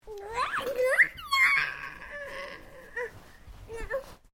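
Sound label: MALE 2 year old TICKLISH; Our friends 2 year old son; Talking (Sound Effects:Voice)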